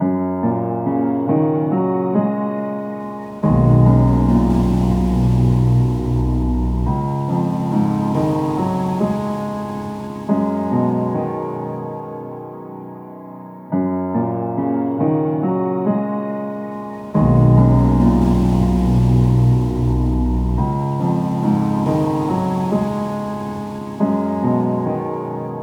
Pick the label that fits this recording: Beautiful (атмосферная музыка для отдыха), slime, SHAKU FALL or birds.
Beautiful (атмосферная музыка для отдыха)